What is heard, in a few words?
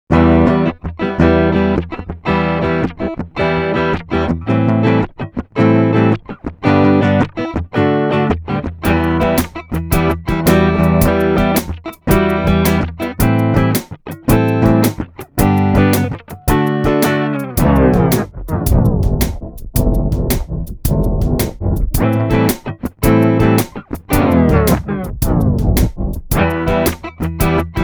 Bend Down Shuffle Rhythm